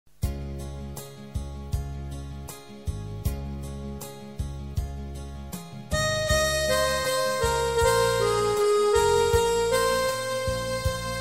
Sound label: instrumental madeh; ae moula ek nazar ae aqa ek nazar; dawoodibohra